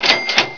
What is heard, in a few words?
ka-ching